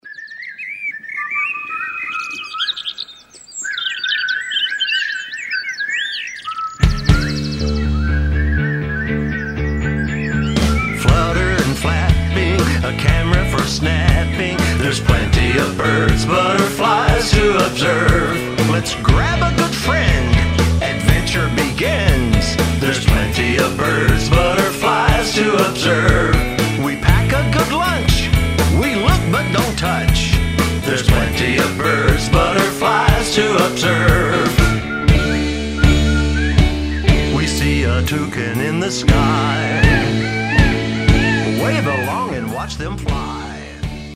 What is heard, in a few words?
Flutter and Flapping Butterflies Birds